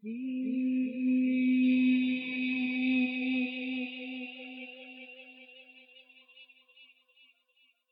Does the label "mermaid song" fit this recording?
Yes